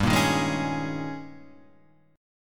G sharp Major 7th Suspended 2nd Suspended 4th

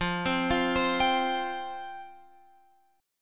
F Suspended 2nd F Guitar Open F; Fsus2; arpeggiated

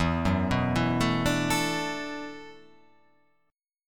E 7th Suspended 2nd Suspended 4th, down

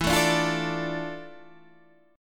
D Minor E, chord; Dm/E strummed; JGuitar

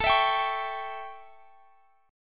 A 7th A, Guitar Half Step Down

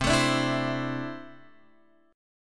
C 6th Add 9th C down Guitar Open F; strummed; JGuitar

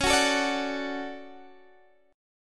D Major C sharp, guitar

